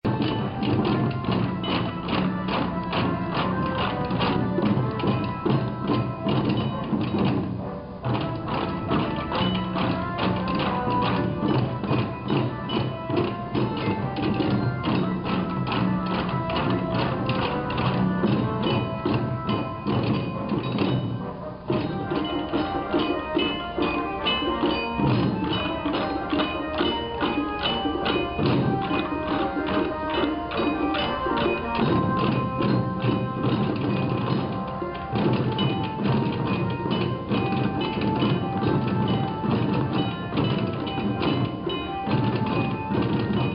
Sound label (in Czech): bubny deti, Japan